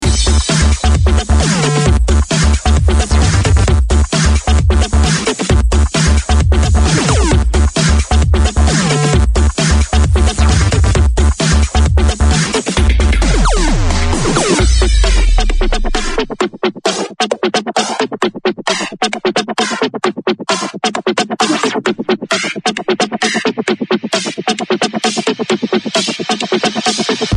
funk; ibreaks; electrobreak

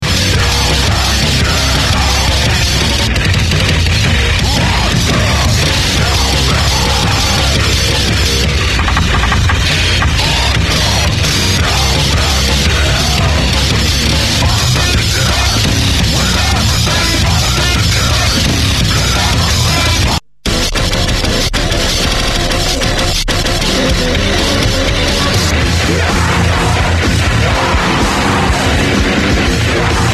drumcorps, breaks

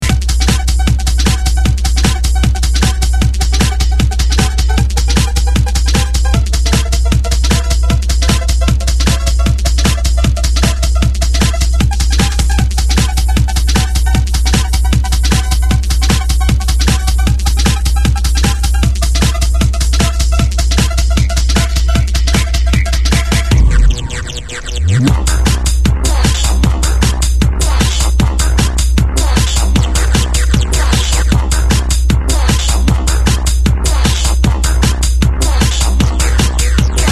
crystal distortion; techno

